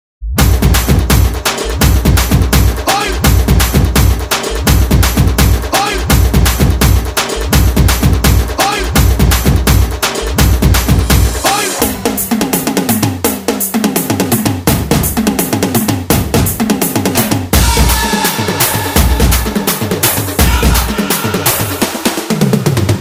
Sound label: Tapori Beat